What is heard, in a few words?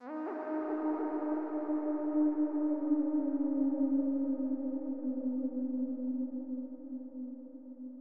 Synth Wolf Howl 1 shot; Zenboy1955